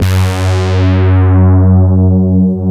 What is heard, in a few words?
CMI PIANO; Wizoo; Powered DX